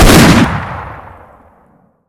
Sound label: fire